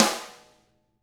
RIM; ILIO; Double Platinum Drums 1

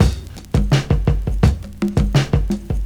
PERCS, 70 s Breakbeats

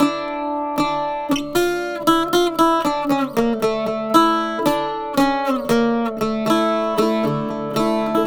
Arabic Mandolin; Sonic Foundry (Sony Creative Software); Continental Drift Aftershocks

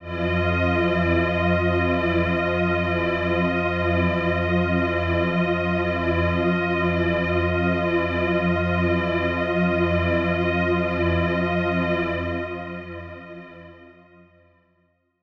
One Shot Drone F, dystopian drone; One-Shot Drones

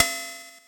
Ride Hat; Yamaha PSS-170

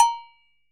Agogo; Best Service; Festa Latina VOL-1; Single-Hits

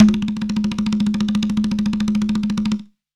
conga roll; STEREO CONGA; Cuba

